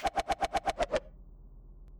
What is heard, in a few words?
Swing; pangya fantasy golf